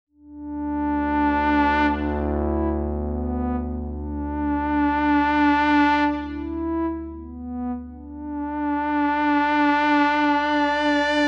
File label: Vox Pipe, Roland JU-06; wind; SoundDiviner Project; Roland JU-06 Pack06; DigiD wind pack